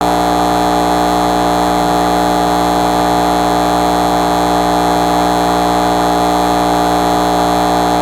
Light Buzzing

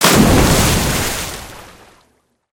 water splash close; destructibles

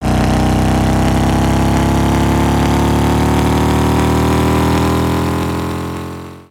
diff MOTO booster, vehicles; aronwk collection; ndaudio

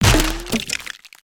instrument smash; destructibles

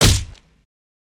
cannon floor hit

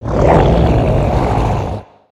Tentacle Monster Growl; destructibles

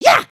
headbang; emotes

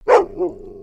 doberman bark

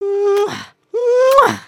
kiss

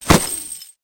Capt Recoil; ambience; gnarled forest

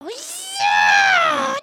collapse

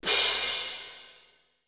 cymbal

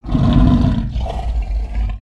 Pet Dragon Growl; creatures pets

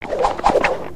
Pet Doberman Approval; creatures pets

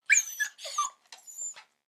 Pet Dog Small Idle; creatures; pets; Lego

